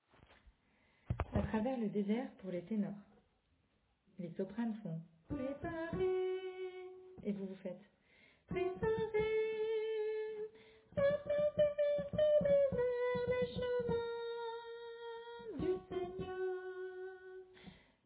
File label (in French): Tenor